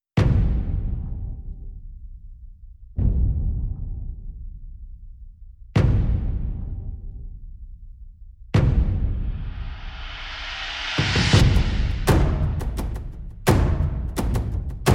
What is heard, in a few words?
perc only